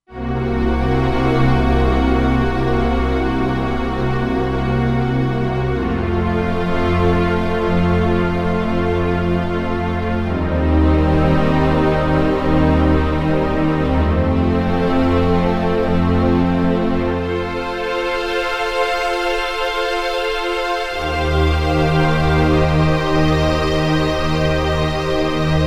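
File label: String Smooth Ensmbl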